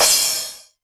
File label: 909 Crash, Novation Drumstation; Novation DrumstationV1